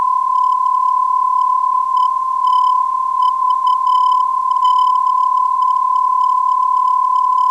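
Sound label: TV 321khz, non-directional beacon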